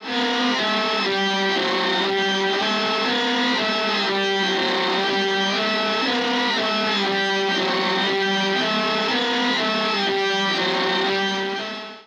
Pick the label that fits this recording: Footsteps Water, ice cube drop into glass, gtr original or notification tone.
gtr original